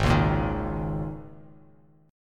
G sharp Suspended 2nd Suspended 4th, G sharp, down, Bass Standard; G#sus2sus4; strummed; JGuitar